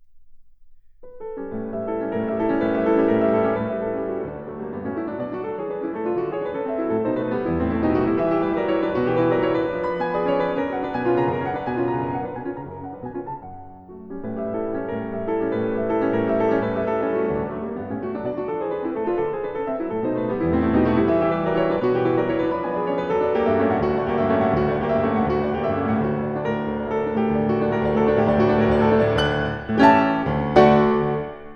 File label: Prelude in D major, Piano; Chopin; Preludes